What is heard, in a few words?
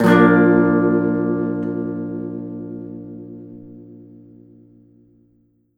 guitar